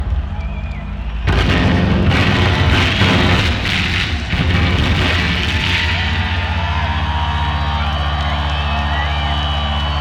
Bass Solo

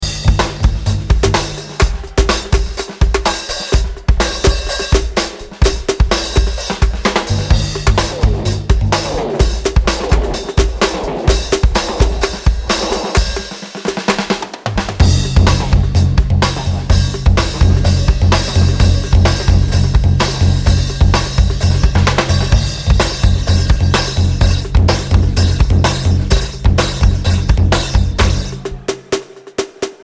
Drum Dub

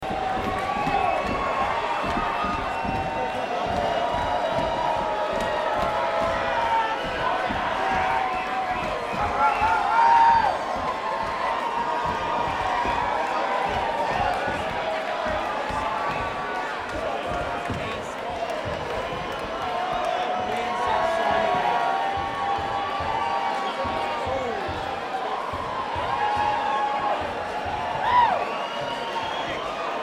Crowd

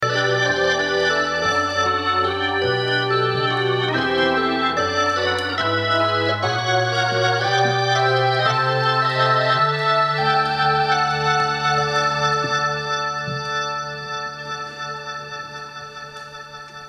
Organ Intro

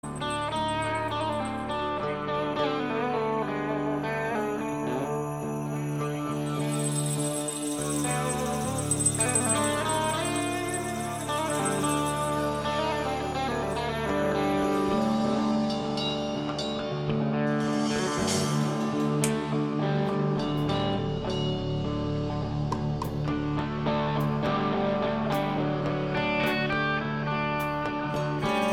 Middle Eastern Jam Space Jam

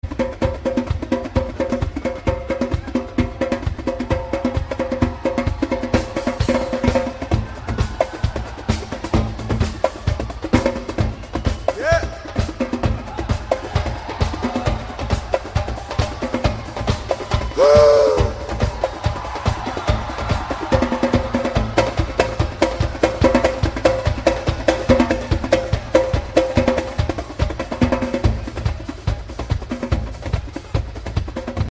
Percussion Jam

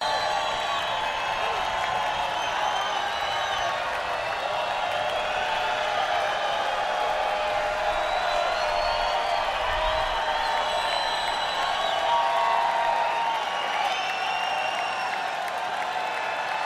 Crowd Noise

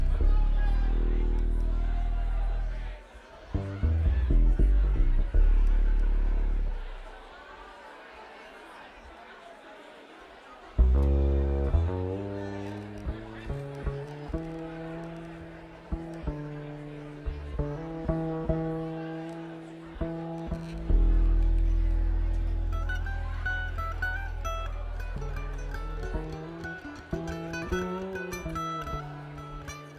tuning

